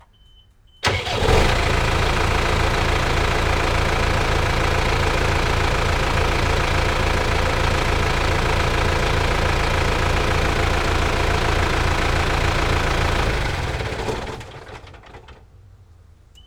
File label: tractor Case II CX90 exterior engine on idle and off stereo